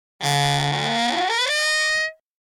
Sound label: Door - Wood Creak Slow Long; Hollywood Edge; sound designer's toolkit v2